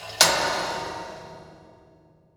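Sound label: prison ambience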